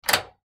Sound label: Stove Knob Turn On, Stove Knob Turn On Antique; Household; Historical Antique Appliance; Appliances